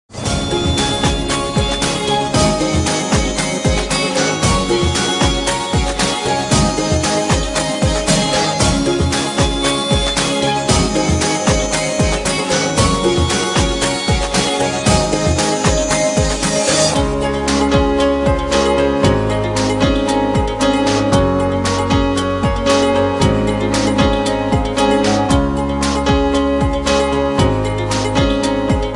Bg Tune

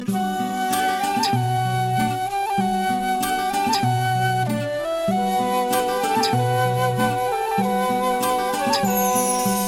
New Flute

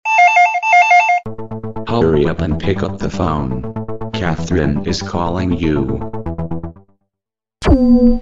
Ringer

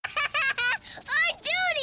Haha

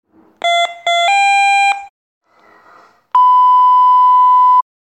ht1250 tone

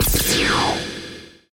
Trap

